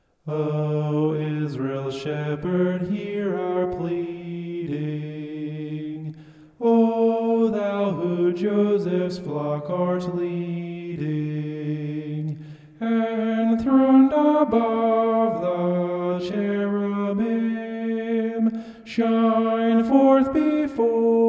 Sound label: vocal homoph; psalm 80